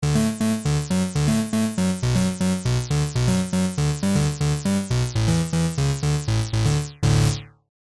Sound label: bass